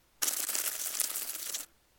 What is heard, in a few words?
slug